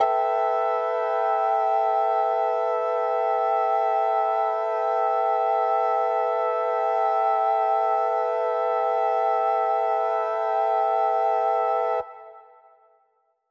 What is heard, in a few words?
Mixed Winds A7:9, synth orchestra